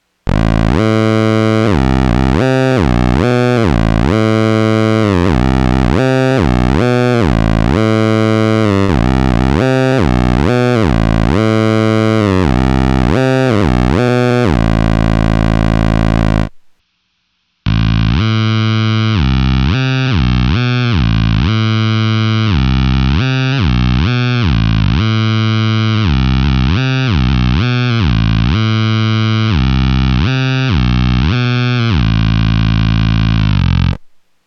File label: octavius squeezer square, bass